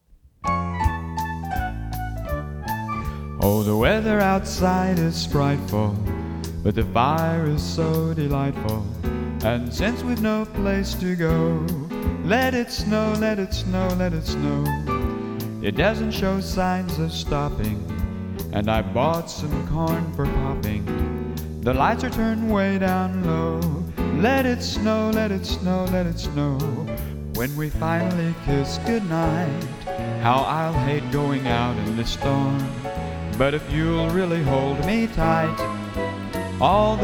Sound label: christmas music